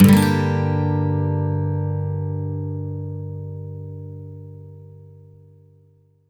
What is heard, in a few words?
MIN SWEEP G, Electric & Acoustic Guitar Loops; USB Soundscan vol.04; AKAI